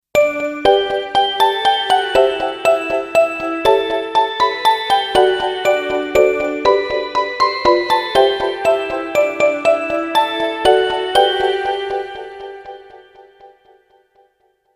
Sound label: we wish you a merry christmas ringtone